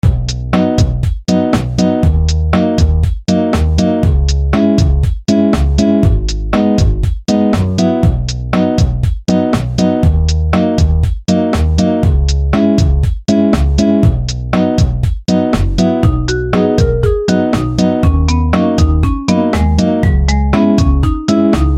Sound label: Island Tune